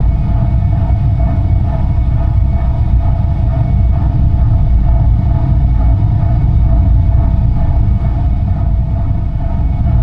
Purple Engine Glow, ambience spaceship

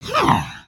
idle, creatures organic

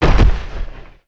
Dragon Foot Left

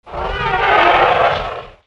Dragon Chase Roar

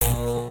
Short Shock

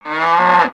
Pet Buffalo Happy; creatures pets; Lego

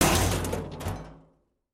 collide, vehicles; aronwk collection; ndaudio

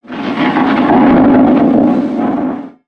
sky rumble Short

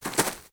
Footstep; Lego; Strombie; creatures organic

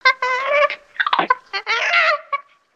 monkey scratch; avant gardens

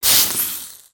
Fire Extinguish

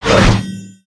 Sorcerer Slash; Valiant; aronwk collection; ndaudio

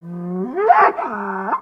Pet Buffalo Ecstatic; creatures pets; Lego

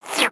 Frog Hat Slurp; creatures organic; Ribbit Frog

